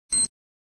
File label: c4 beep